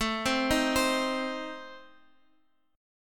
A Diminished, Guitar, Open F, Adim chord; arpeggiated; x,x,9,7,6,7; JGuitar

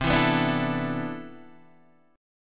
C Major 13th C, Guitar Open D

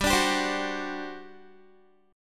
G Major 7th Suspended 4th, Guitar; GM7sus4; strummed; 15,17,17,17,18,x

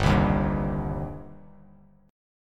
G Suspended 4th Sharp 5th G down Bass Standard; Gsus4#5 strummed; JGuitar

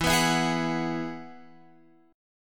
E Major Add 9th E, Guitar, Open F; x,11,11,11,11,13; strummed

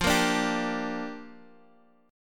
G Minor 7th, F

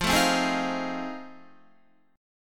F 7th Sharp 9th, Guitar; F7#9 strummed

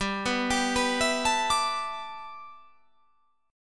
G 6th Add 9th

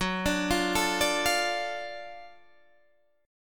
Gb Minor Major 7th Sharp 5th, Guitar, Open D; 16,17,15,15,17,15; down; chord shape

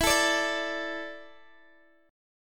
E 7th Suspended 4th, Guitar, Open D

